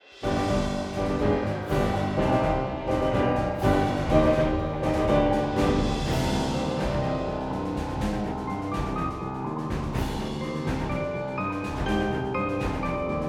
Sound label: Music Main Hall